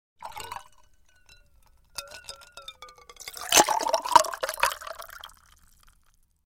Liquid Pouring Over Ice Into Glass Swish Cubes in Glass, Household